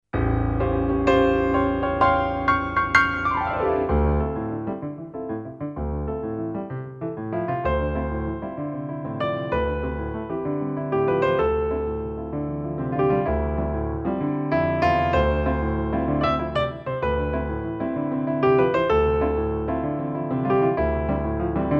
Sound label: Grand Piano